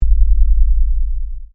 LOW FREQ STRIKE SYNTHETIC, Low Frequency sweeteners; Stab Sound Effects; Stabs (Sound Effects:Sound Design)